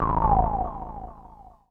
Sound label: KEY SHOT TEKNO 140 A; Techno2; Singleshots